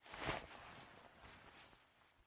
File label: Player Fall Start; MiSide